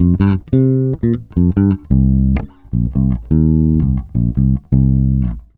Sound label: FUNKY 86 C, Bass Grooves